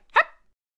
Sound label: Attack Vocals